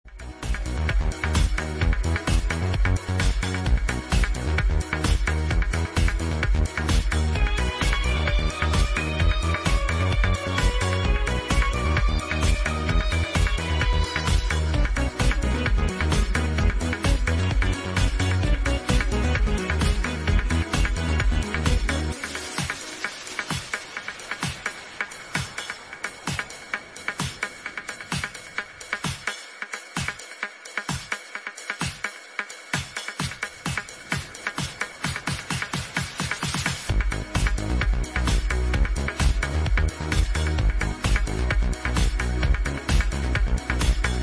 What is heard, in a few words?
bgm free